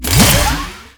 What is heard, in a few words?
Shock Traps Embed; Warframe; Captain Vor; Abilities Redux